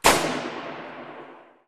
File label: shoot; gmod; zerolib